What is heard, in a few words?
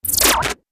Synthy Beeplike Zap, zaps; Synthy, Beep-like Zaps ( Last One Has Buzz & Scatters )